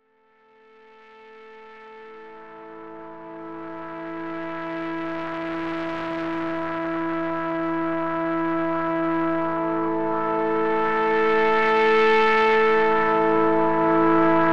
Roland SH 01A Pad

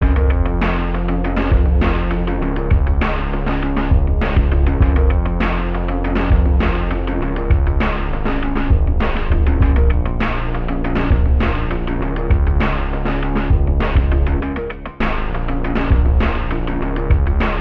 Lo Fi Synthwave

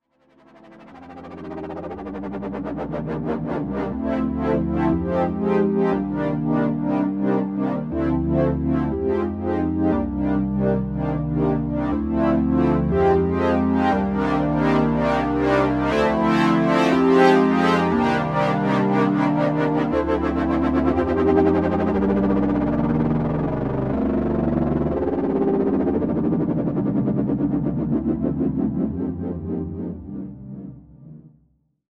Jupiter 8

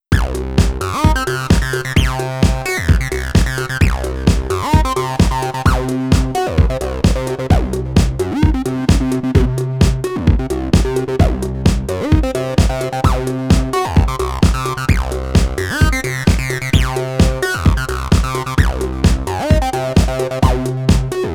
Acid Pattern

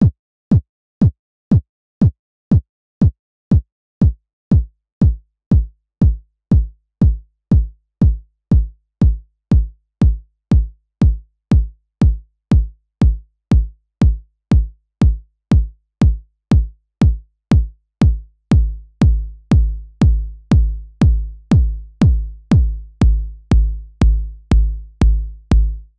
Kick

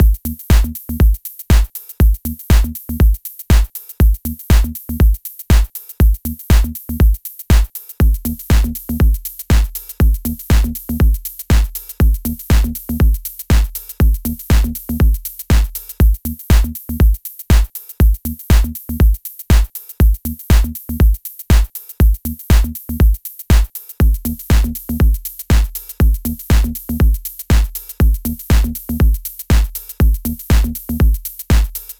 Drum Buss; Ableton 10

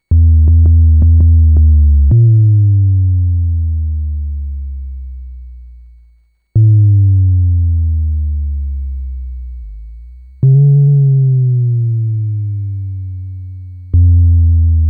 monologue jungle sub

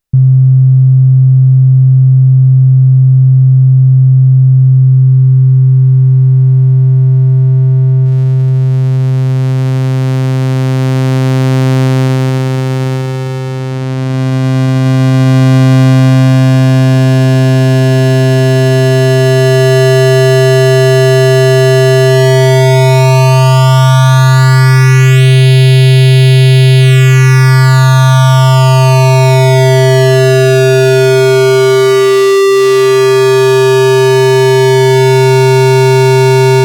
Behringer Neutron Synthesizer Waveforms

